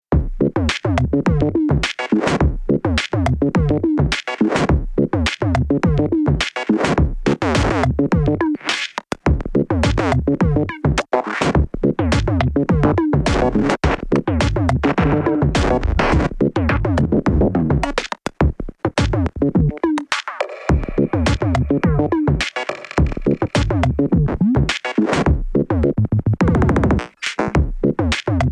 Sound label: Ominous Music